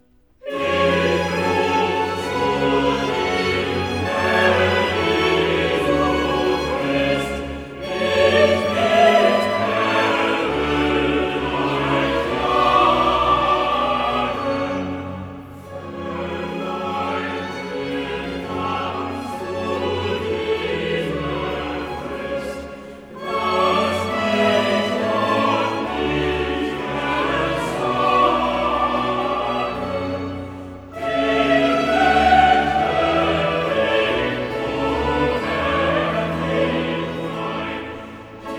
Choral, cantatas; Bach; BWV 185; Ihr Herzen, die ihr euch; Ich ruf zu dir Herr Jesu Christ

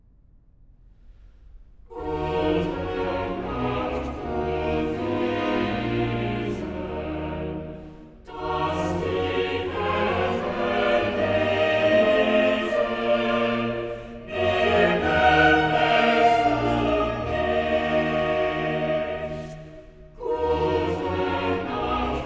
Choral; BACH VOCAL; CANTATAS; BWV064; Sehet, welch eine liebe; Gute Nacht o Wesen

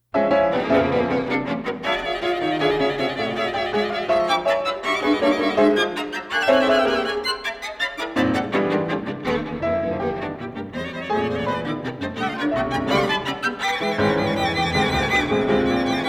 Fur Streichquartett, Klavier und Sprecher; SCHOENBERG; Ode an Napoleon Bonaparte, Op. 41

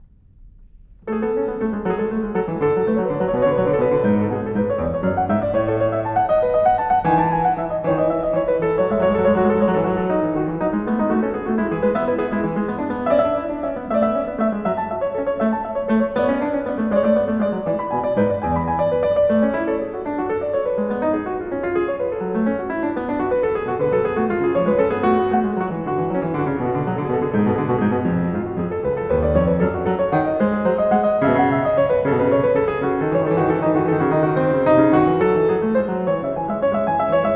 Einstimmige Inventionen A-Dur, klavier; Einstimmige Inventionen XII) A-Dur; BWV 783; BACH; Inventions

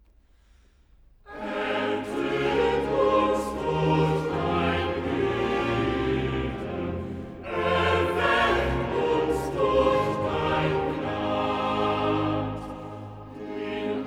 Choral, cantatas; Bach; BWV 132; Bereitet die Wege; Ertцt uns durch dein Gьte

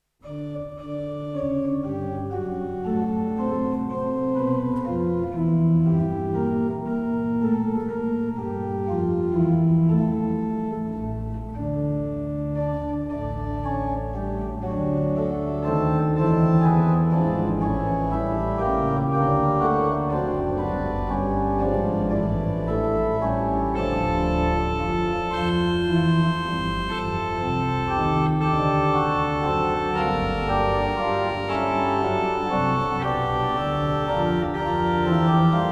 Schübler Choräle, ORGAN; Bach; Organ Works; Meine Seele erhebet den Herren; BWV 648

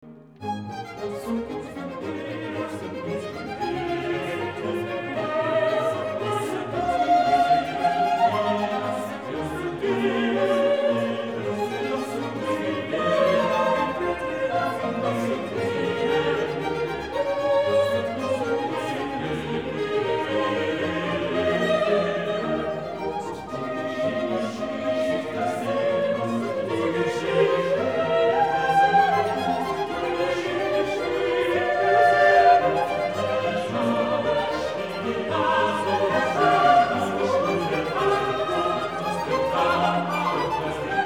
Coro Lasset uns nun gehen gen Bethlehem; BACH; Christmas Oratorio

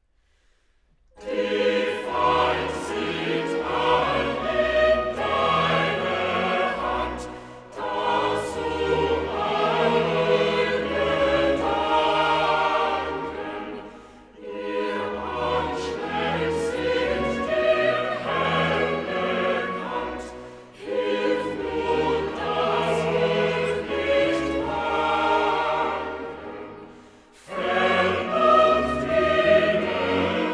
Choral, cantatas; BWV 178; Wo gott der Herr nicht bei uns hal; Die Feind sind allin deiner Hand

